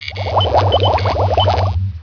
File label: factory; StarCraft v2.2.2; terran units